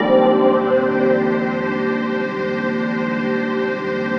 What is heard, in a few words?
PAD, Dance Mega Synth